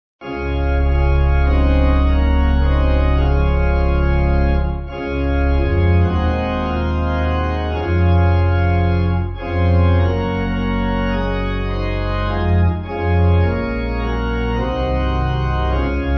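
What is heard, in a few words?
Pipe Organ, Music Backing Files for Churches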